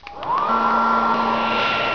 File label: HAIR DRY; 机器声音音乐素材